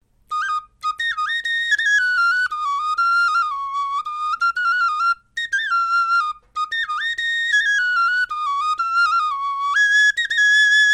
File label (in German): melodie